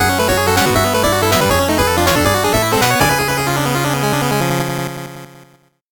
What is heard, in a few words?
8bit jingle normal